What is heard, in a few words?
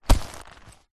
leather handbag or pouch grab